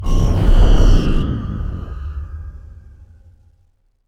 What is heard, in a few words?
Sting; SeargentPart2